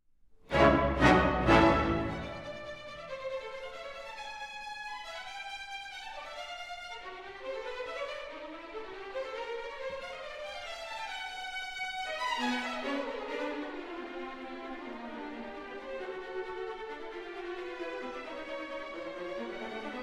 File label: Brass; Konzertmusik; Brass of the Royal Concertgebouw Orchest